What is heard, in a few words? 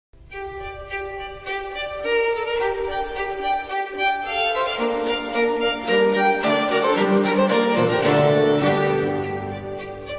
english 16thc folk